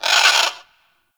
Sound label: Forest Creature Mid Medium; Warframe; Grineer